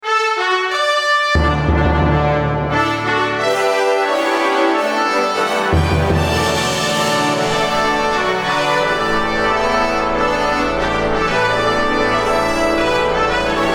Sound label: Royal Fanfare